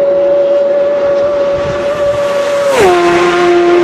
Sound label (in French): Son Moto - Doppler